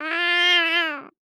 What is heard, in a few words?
Animal Mammal Carnivore Cat Domestic Cat Meow Angry; animals; AdobeCC